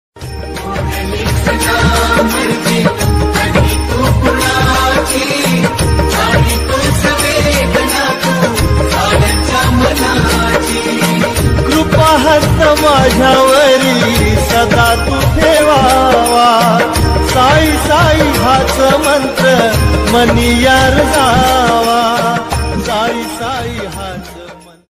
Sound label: sai baba ringtone marathi devotional; shirdi sai baba